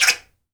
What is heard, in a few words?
TOY UP D, toys percussion